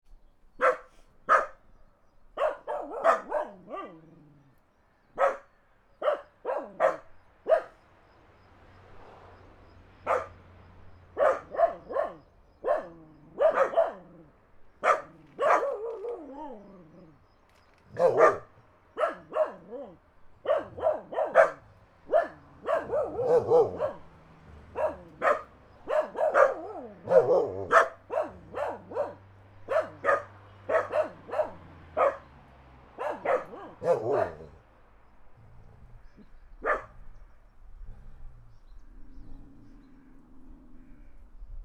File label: dogs bark country car pass; spoteffects